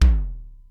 Simmons SDS-9 drum machine; Factory C4

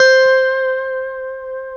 C JC120; Guitars; Spectrasonics; Hans Zimmer Guitars VOL-1; JC-STRAT MF

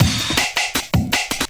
Drum & Bass, 160 BPM

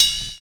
SNAP; Univers Sons - Kitch 70s

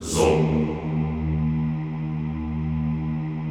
ZOOMMM, Extended Classical Choir; Best Service

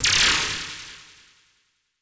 CABL TEN, CABL TENS; Total Control - Action Cutz

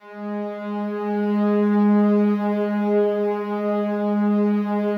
SLOW STRINGS 2 G#2 R, Yamaha VP-1; 90 s Sample CDs; Music Store

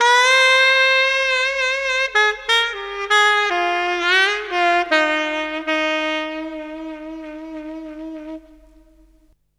Sax Lick; Drum & Bass

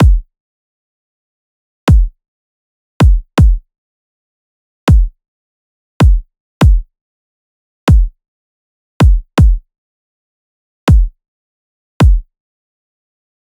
loop kick 80; Seismic Loops

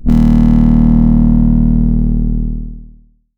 BASS SICK D

